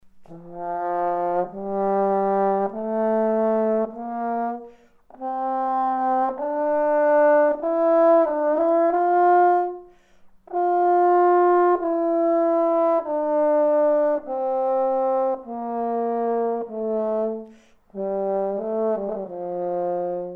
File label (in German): Horn